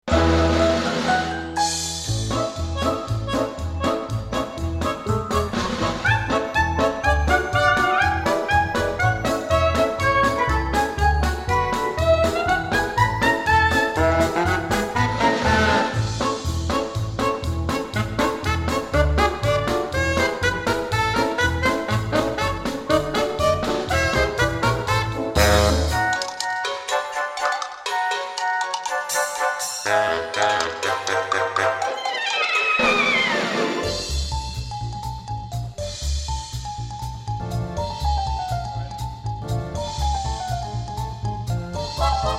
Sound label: Happy Flowers, Happy Mood; psychonauts; Gloria's Theater